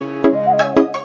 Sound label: flute sms ringtone